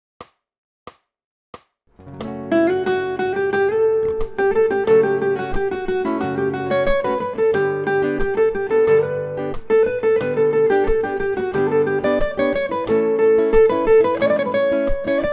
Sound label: guitar